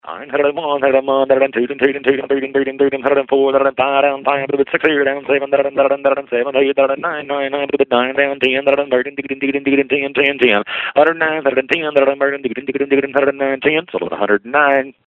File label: chant